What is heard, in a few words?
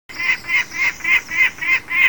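falcon peregrine, birdsong; falconperg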